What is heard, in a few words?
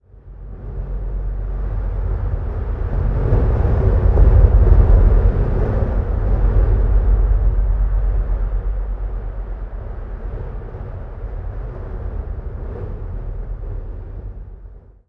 environment temple winds stinger outdoors